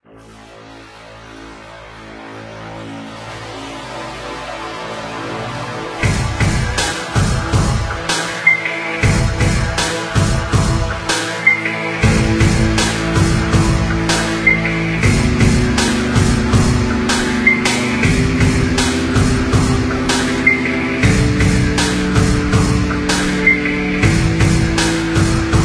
Tension; mad father remake

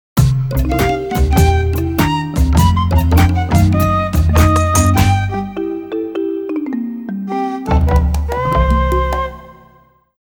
Forest Zone Completion; frogger he s back

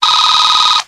incoming message

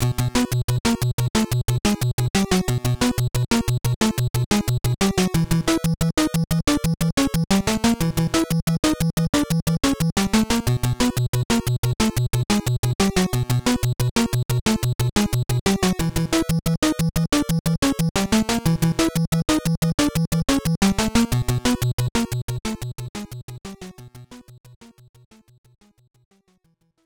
Moving Enemy - Tunnel Areas; Getsufuu Maden; NES

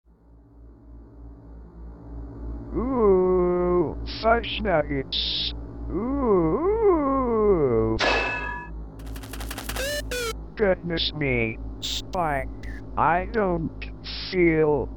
Spiked Up (Instrumental)

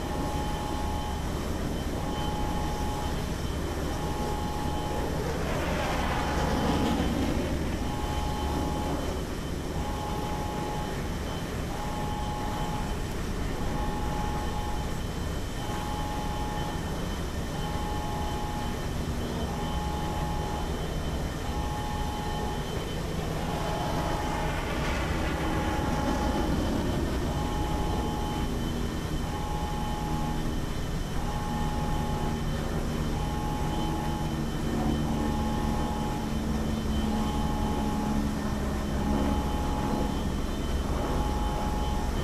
Ambience

